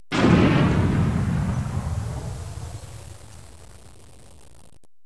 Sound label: EXPL; explosion sounds